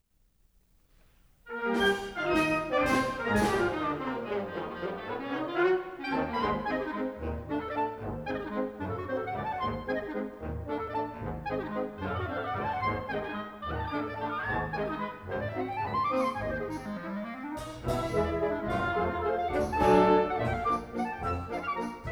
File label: Waltz b